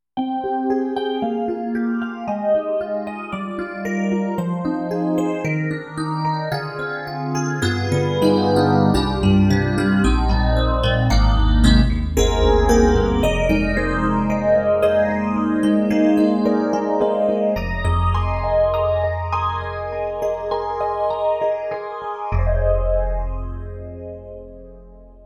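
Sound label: SD-90 Enhanced Chorus Electric Piano Phase FM Electric Piano; EDIROL; Phase FM EP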